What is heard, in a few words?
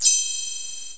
gliss